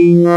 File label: ppg feedback, wavetables